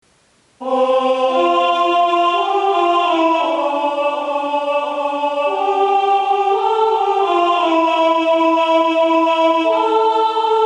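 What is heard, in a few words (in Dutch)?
Kerstliederen sopraan en alt; Kerstliederen bew jos de ryck; sintjanskoor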